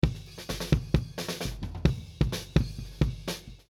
Multimic Facets Kick Batter

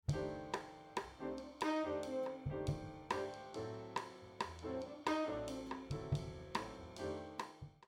Snare Mix Proc EQ

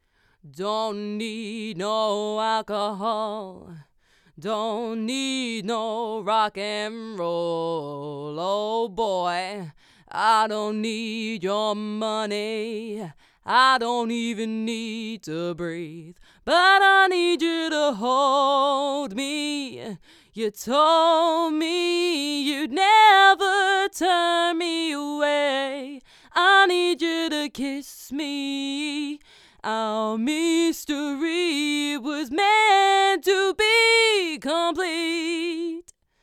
Vox Shoot Samson C03 Card

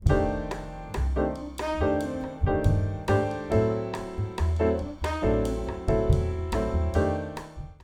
Piano Meld Inv

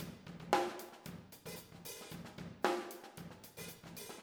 OH Raw

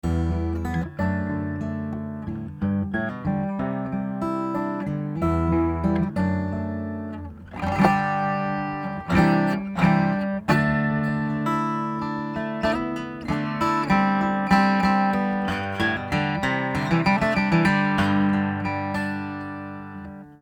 Acoustic Guitar One Mic; Manufacturer Audio

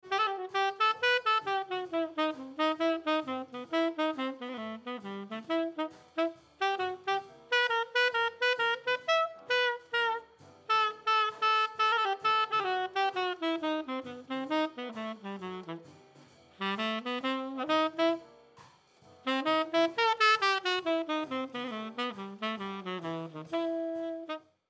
Spill Enhancement Solo Sax; Recording Mistakes

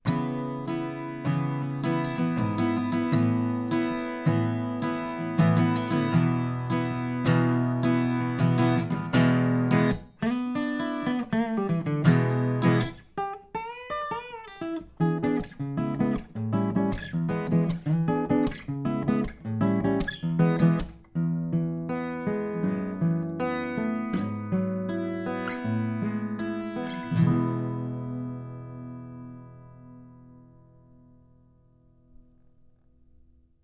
Ac Gtr C414BULS; Ac Gtr Shoot

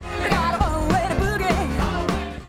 Automation Mumbled Syllables Automated

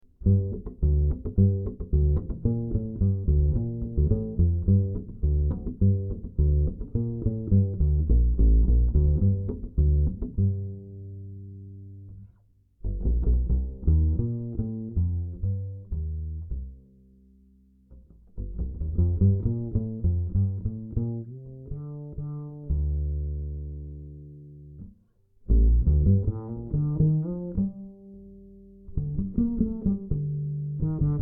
Ac Bass plucked, DI

